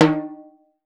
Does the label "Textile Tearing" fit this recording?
No